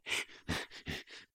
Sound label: Run Grunts; SyrinScape; City gone mad